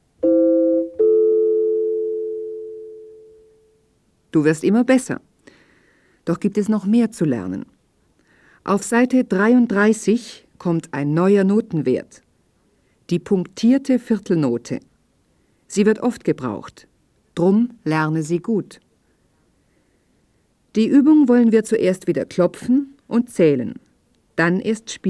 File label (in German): Spiel Blockfloete